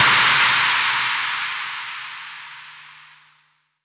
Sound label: Crash 140BPM; D minor; Drums & Perc; Vengeance Samples